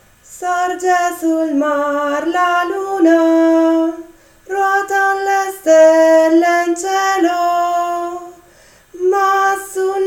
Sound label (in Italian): soprani